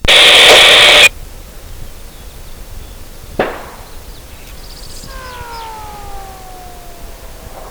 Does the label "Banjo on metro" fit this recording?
No